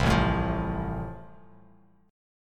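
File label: A Minor Sharp 5th, Bass Standard, chord strum; Am#5 strummed; 8,0,7,10; JGuitar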